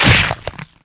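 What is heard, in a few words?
flesh hit; Nevergrind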